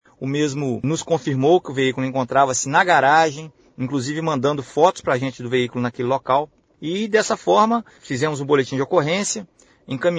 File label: Rhodes